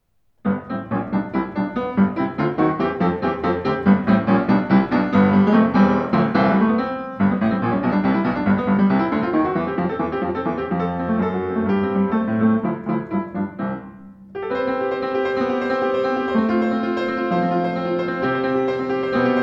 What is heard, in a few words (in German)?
Sauter Rag, Klavier; 112 sauter schwarz